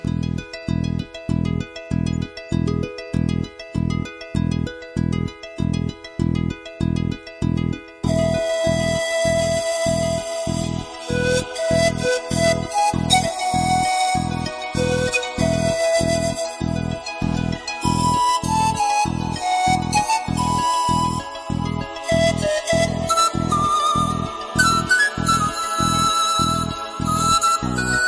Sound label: Pan Pipe; Pan Pipe Moods